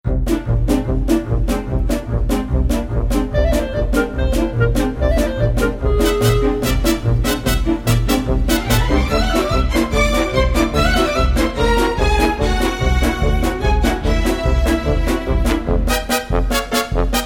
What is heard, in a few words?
Penguin Quirky